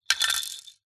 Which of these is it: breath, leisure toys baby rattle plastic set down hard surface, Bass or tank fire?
leisure toys baby rattle plastic set down hard surface